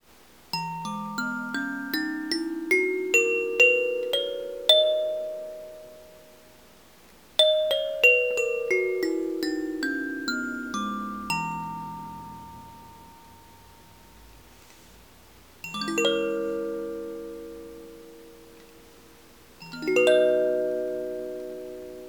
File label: 11 note kalimba instrument kalimbas mbira; Arcadian